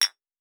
Glass; Survival Game Sounds - Lite Edition

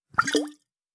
Vessel; Survival Game Sounds - Lite Edition

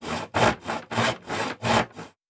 Saw Wood, Crafting; Survival Game Sounds - Lite Edition

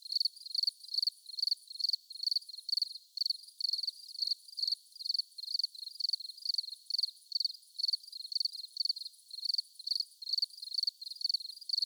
Crickets Loop; Survival Game Sounds - Lite Edition; Environment

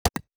UI Simple, Interface

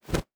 Cloth Foley; Survival Game Sounds - Lite Edition

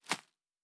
Leaf Run Surface, Footsteps; Survival Game Sounds - Lite Edition

